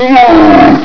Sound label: die; svencoop; classic; imp